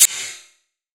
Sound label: Perc, Gated Reverb; musicradar